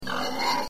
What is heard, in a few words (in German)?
Schwein; Tierstimmen